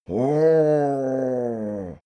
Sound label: male grumble happy; menschen; koerpergeraeusche; soundpool